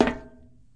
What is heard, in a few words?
pl tin, player; cstrike